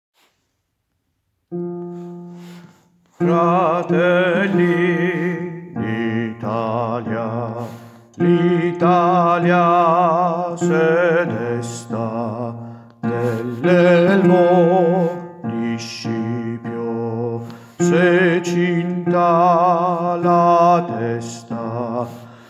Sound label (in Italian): inno mameli bassi